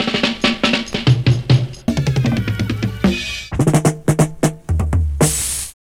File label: Reggae Drum Fills